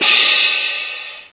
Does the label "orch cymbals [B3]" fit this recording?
Yes